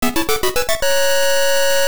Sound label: Fanfare Heart Container; "Get Heart Container" fanfare